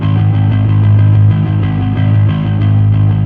Fuzz G sharp 1; torchlight iii; ps4

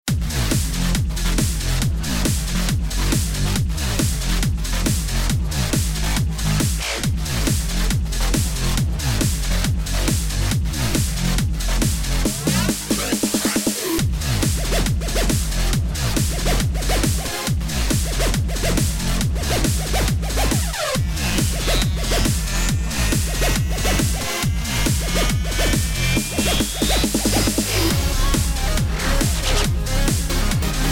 Boss Loop; ICEY

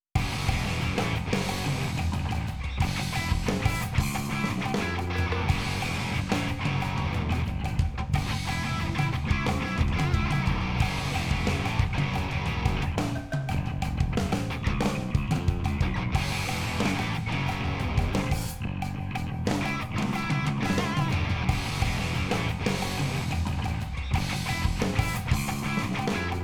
BGM WarmingUp Phase2; Splatoon 3

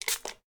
Spray; blastmorph pinball; xbox series xs

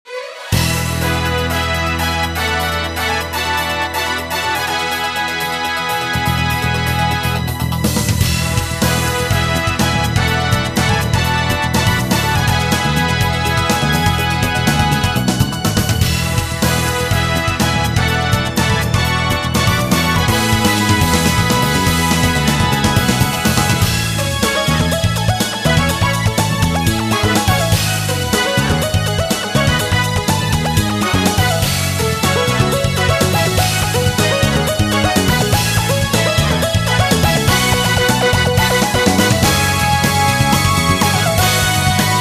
Music Menu event

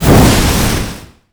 fire light, candle blow

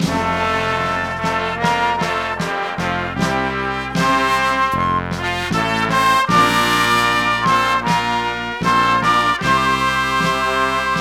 BGM sample fanfare; Kinnikuman Muscle Grand Prix Max; ps2

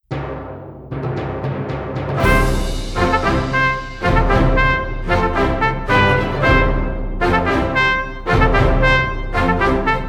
British Travel Music; pirates of the burning sea game soundtrack 2007

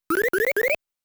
Boss -Stricken; Galaga

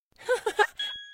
upgrade Celebration; Becca

